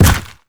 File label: step heavy, zombie blind